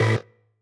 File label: marimba; ゆめ2っき